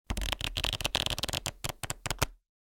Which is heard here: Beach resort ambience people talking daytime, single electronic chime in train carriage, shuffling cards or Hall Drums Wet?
shuffling cards